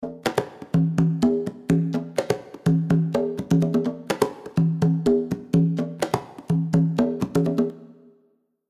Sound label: Reverb ER On Hall